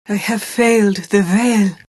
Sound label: death; dota; phantom assassin